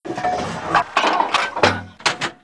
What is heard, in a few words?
reload big shell; emplacement